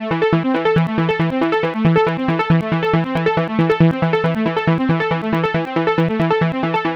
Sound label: trance pluck arp 138 bpm am